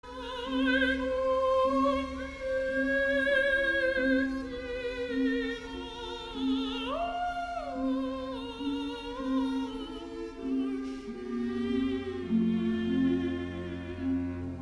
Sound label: cadenza; nacht